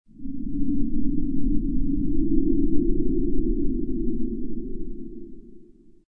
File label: hallway wind; Dystopia